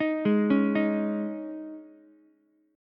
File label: G sharp Suspended 4th G sharp, Ukulele; G#sus4 arpeggiated; JGuitar